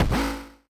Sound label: robot footstep short